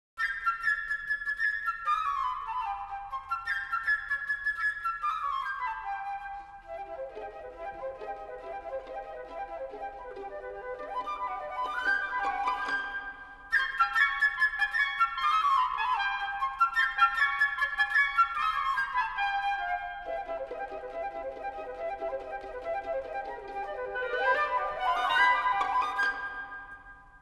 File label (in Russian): Симфоническая сказка Тема птички; Прокофьев; 'Петя и волк', соч 67